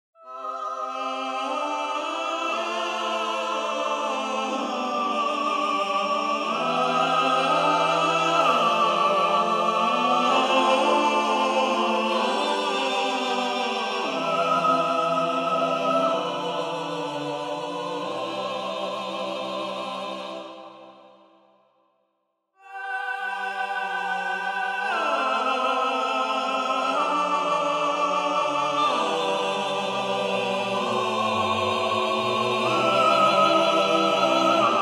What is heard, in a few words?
counterpoint; Artinfuser Counterpoint